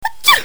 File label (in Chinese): 打哈欠